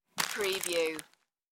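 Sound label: Grab Plastic Wrap Food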